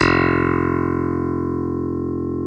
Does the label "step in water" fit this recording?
No